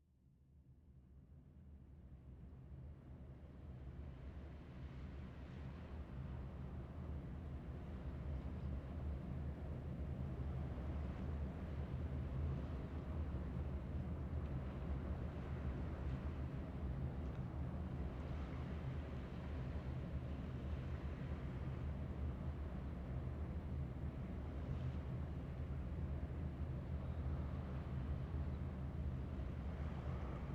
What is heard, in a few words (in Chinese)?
夜行郵輪的室内聲, ASMR; 自我介紹; 序幕。白銀的自我介紹; 蓄音レヱル しろがね